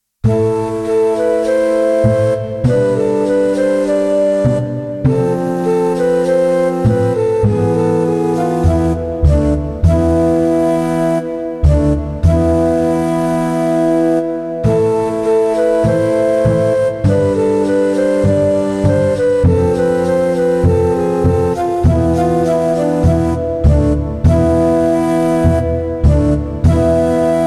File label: music